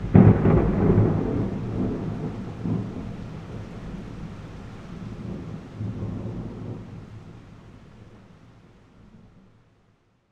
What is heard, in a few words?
Thunder